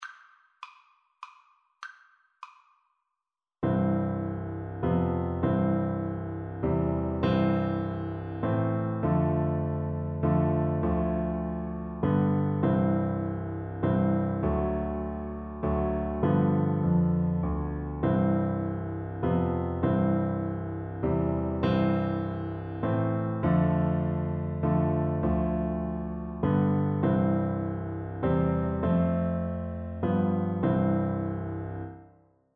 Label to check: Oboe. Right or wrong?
Right